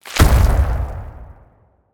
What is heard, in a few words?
Stone Slab Impact With A Crunch